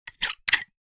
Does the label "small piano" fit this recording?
No